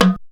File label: JUNKU HITS; Best Service; World Colours